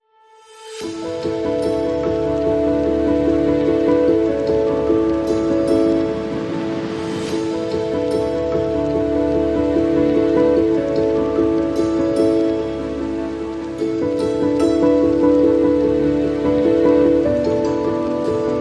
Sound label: Ambient